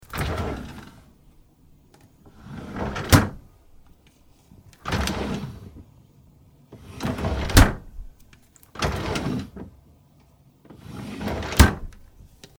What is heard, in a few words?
refrigerator